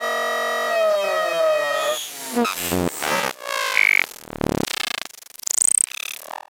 Digital Buzzing Rattle Glitch

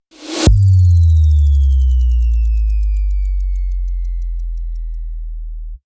Approach Bass Drop

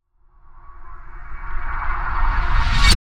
Swooshy Approach

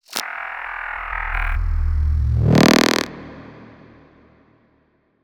sci-fi buzz

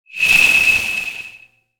Sci-fi Transition

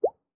Mouth Blip

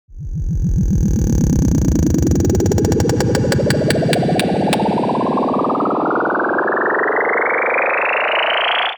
accelerating sci-fi drone

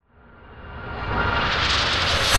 Swooshy Approach

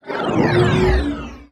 Sci-fi Transition

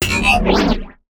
Sci-fi Drone Maneuver

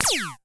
laser beam phew

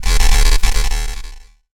Digital Buzzing Rattle Glitch

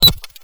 Beepy Glitch

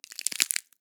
Wood Crackle

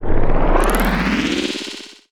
sci-fi engine vroom up

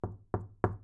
Tripple Knock

